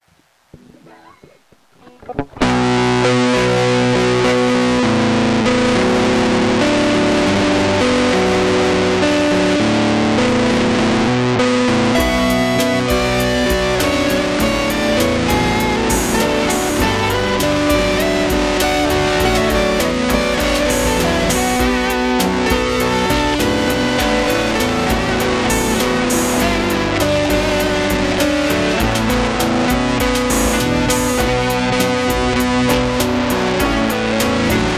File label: Thunderstorm